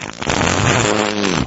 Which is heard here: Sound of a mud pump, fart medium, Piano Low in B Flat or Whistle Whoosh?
fart medium